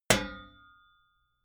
sheet ring

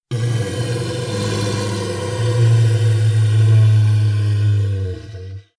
monster inhale low; kreaturen

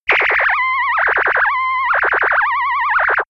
energized vibration, alarm; soundpool

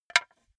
wood board hit loose; objekte; holz

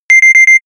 notification pager

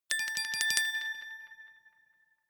glockenspiel sparkle tremolo low octave short

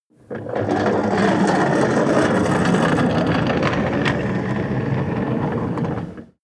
slide wooden door

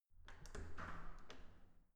entrance door open quick

